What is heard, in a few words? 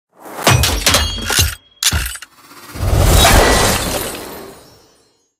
Gold Chest; Tank Stars; android